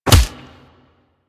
Melee Fist Impact Flesh; Warframe; Weapons; Fists

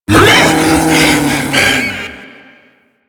Ape Prelate Attack Long C; Enemies; Warframe; Proto Infested

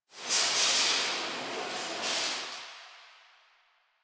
Grocery Cart One Off; Warframe; Ambience; Nineteen Ninety Nine; DE

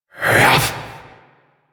Possessed Vocal Attack; Warframe; Priest Quest; Possessed Red Veil B

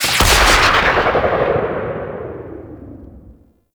Engine Boost; Warframe; Vehicles; Archwing Healer

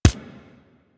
Weapon Punch Pistol; Warframe; Weapons; Pistols

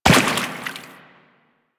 Step Water, Footsteps; Warframe; Teshin

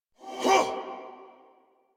Attack Effort; Warframe; Dax Ashigaru; en

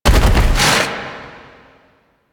Containment Protocol Wall Build; Warframe; Warframes; Qorvex

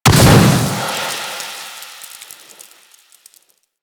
Ghost Death Explosion; Warframe; Quests; Chimera Prologue

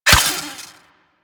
Melee Dagger Impact Robotic Flesh; Warframe; Weapons; Daggers

